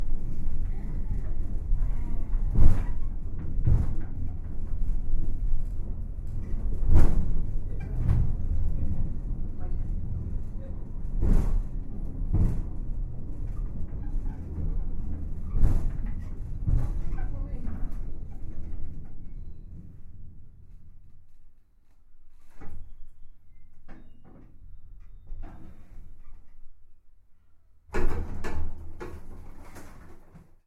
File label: funicular cliff railway; scarborough